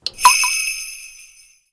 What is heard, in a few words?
martial